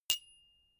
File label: notification glockenspiel single high